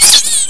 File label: utyuuzin hit